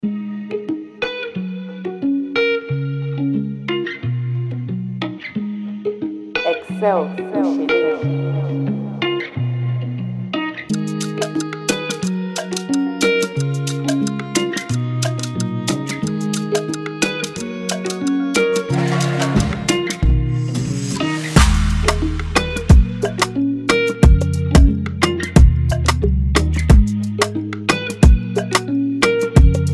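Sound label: Afrobeat instrumental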